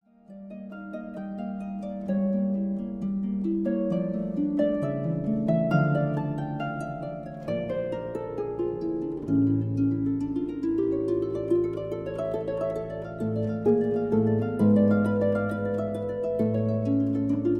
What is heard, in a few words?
Harp, Sonata D minor; Krumpholtz